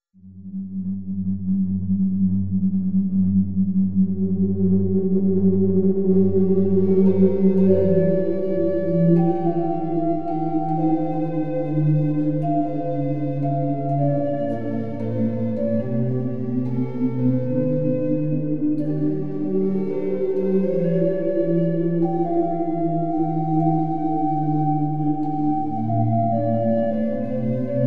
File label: forest song